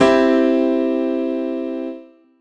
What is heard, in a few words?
Digi Piano Chord; Big Fish Audio; OSH Chords